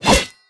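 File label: BOSS Attack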